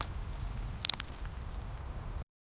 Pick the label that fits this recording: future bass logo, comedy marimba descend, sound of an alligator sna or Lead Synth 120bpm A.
sound of an alligator sna